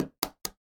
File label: egg konkon